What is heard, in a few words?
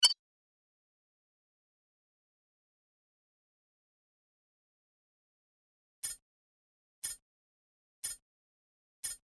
countdown 10 seconds; League of Legends; lol air client